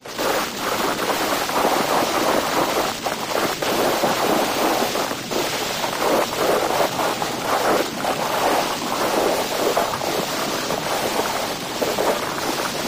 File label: snow noise